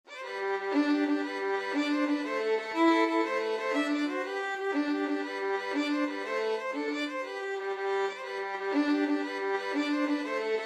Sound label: irish jig medley violin Duet